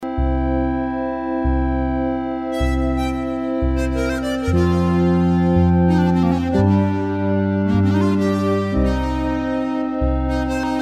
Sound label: HX-1 Energie